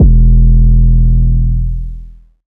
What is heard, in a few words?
808 pound E, Tonal; Hip-Hop; Slate; CAICOS Hip-Hop Sample Pack